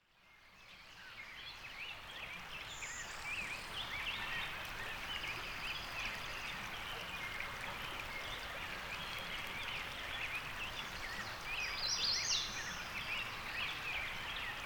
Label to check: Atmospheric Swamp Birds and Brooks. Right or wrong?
Right